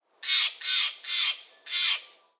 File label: Animals Mountain Jay Caw